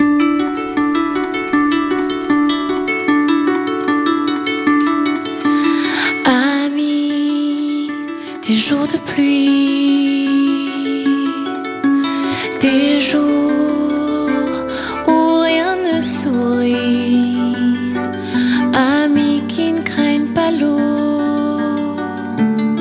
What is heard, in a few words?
rainy day friend acoustic